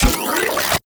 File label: Charge Up; Crp BFG Vandal